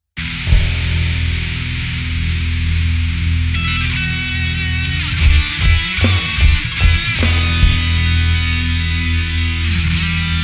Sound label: Metal; Monkey Island; OC ReMix